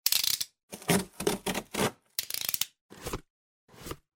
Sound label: cut